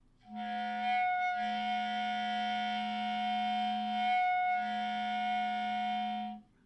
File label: multiphonic